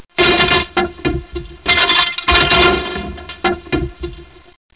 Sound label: GLASS; Talking E-Mail